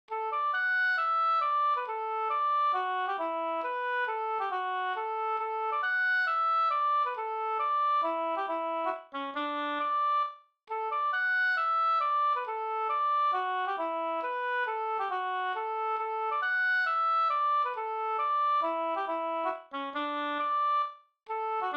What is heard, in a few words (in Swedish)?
hambopolkett